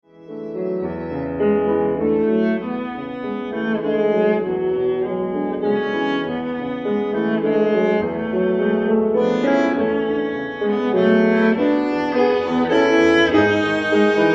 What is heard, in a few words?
Waltzes